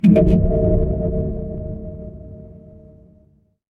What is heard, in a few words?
magic scifi shield